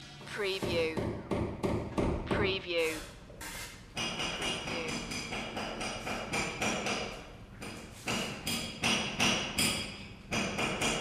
Work Being Done, Hammering, Plastering, Scraping inside building or apartment